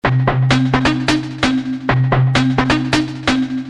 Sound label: loop